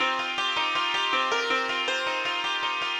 ethno, 160, C

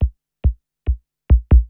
KICK TECHNO 140, Techno Drum; Techno2

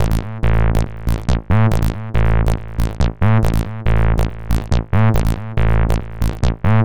HARD BASS 140 A, Hard Trance, Bass

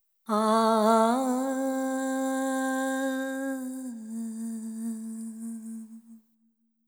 ETHNO FEMALE, Vocals; ethno2

